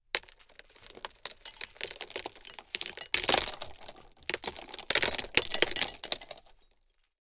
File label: Slowly pouring small gravel